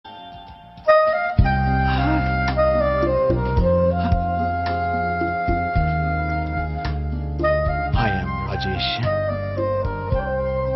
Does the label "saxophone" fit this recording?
Yes